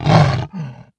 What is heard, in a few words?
attack; mahlendur